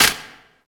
Rifle Ricochet; projectiles; Max Payne

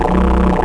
idle, engine; yuri; snake

